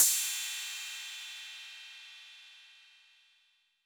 Cym A 808 Sat Decay B, Cymbal, Multi Saturated

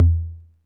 Tom Low 808 Color

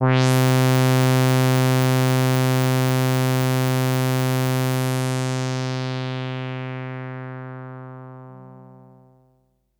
SYNTH Simple Wave

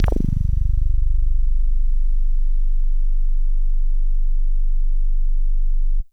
Synthe Bass DX100 G1

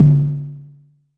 Tom Low

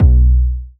B Bass Chiller

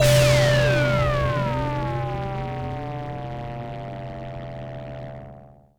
Lazzzer

